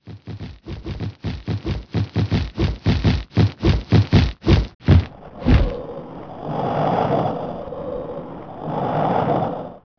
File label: sphinx breath blow